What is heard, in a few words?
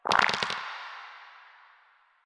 Dice Roll One; Warframe; Koumei